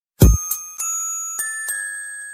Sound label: Bass Sms Tone